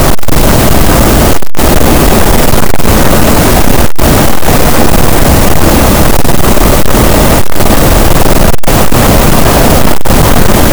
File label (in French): cachalot, gaussian noise; Physeter Macrocephalus